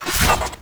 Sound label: Whoosh, Glaive; Glaive Ghost Catcher; Warframe; Weapons